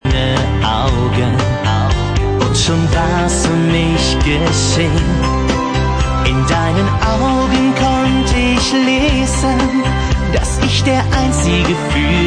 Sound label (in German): Schlagersänger